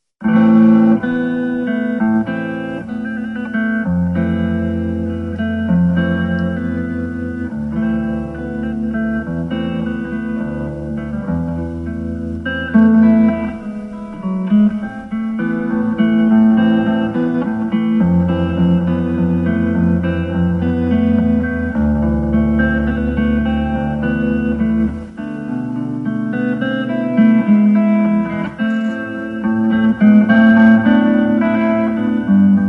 sound check; the nutcracker

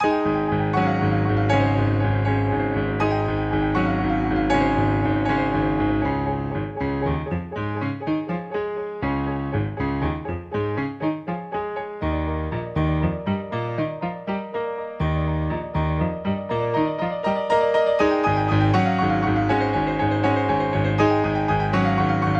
Brutal Waltz

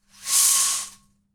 BLIND; 1000fx

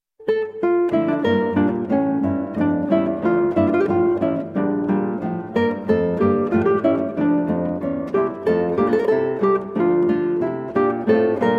Andante, Guitar

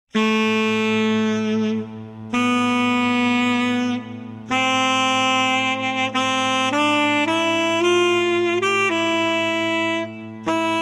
Canon, Saxophone; Andy Findon; Henry Purcell

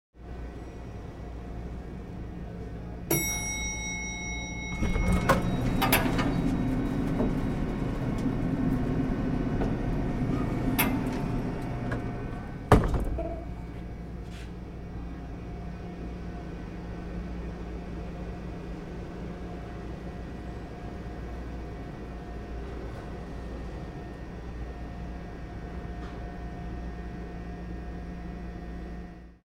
Door Elevator Open & Close w Ding; Doors, Squeaks

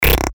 Ultrafast Teeth Chatter Breaker; Best Of N2O Library; Breakers & Transitioners

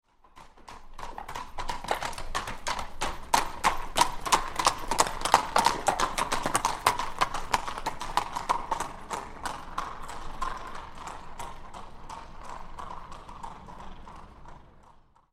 Two Horses Trot By On Cobbles; Historical; Hollywood Edge Historical Series; War Horses Armies & Weapons

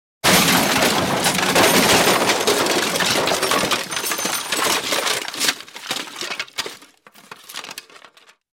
Object Crashing Through Wood & Glass; Crashes Impacts

